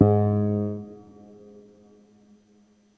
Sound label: soft tines Gs1